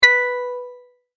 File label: multimedia tone correct